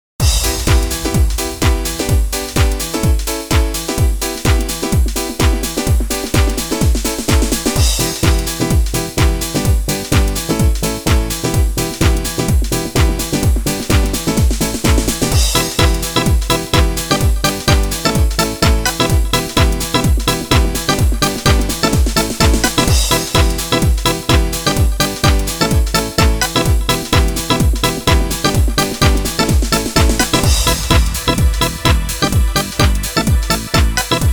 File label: emotional test 127; dmu sync music showcase